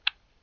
sound click

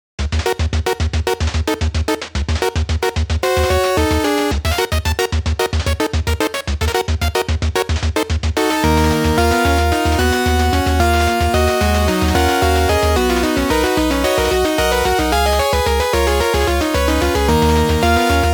Battle Main; PKMN

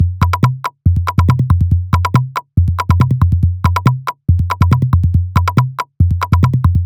tribal loop

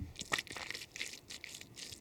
slime squelch

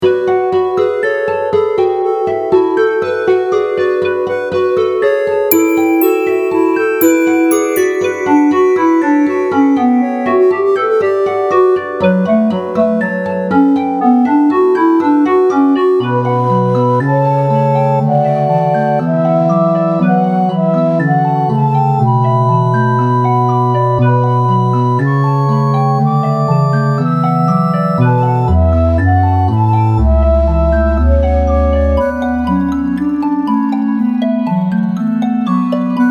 hymn

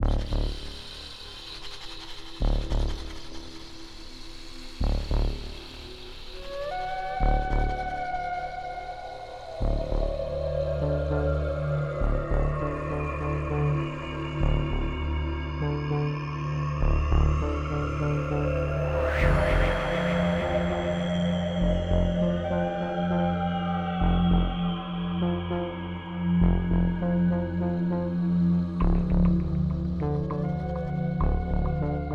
dark forest

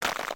ice

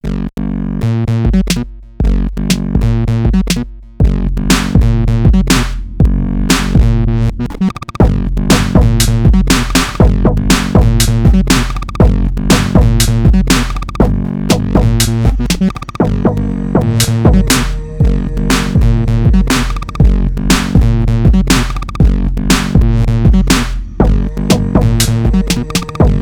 feel good; abstract code ost

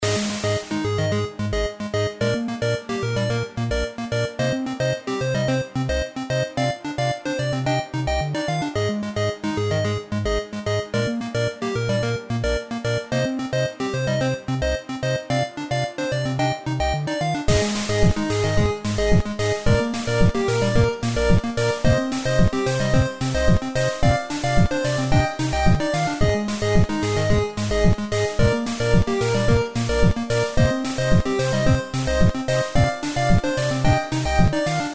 Electrix NES

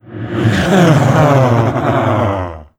evil cyber laugh

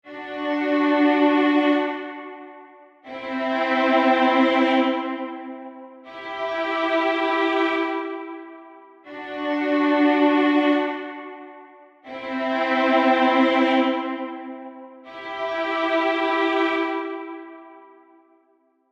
bad violin

